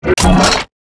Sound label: skewer mech